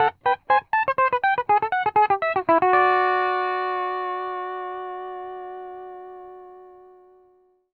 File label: PD LOOPS 120, Whole Lotta Country